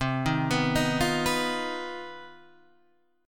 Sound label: C Minor Major 7th Double Flat 5th C down Guitar Standard; arpeggiated; JGuitar